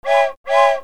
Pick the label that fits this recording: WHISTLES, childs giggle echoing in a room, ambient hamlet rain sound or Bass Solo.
WHISTLES